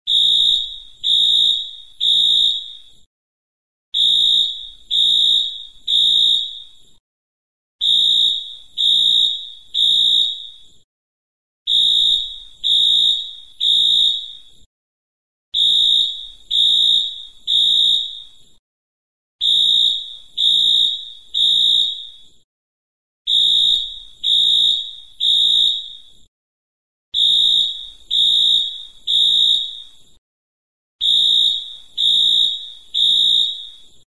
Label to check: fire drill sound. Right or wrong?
Right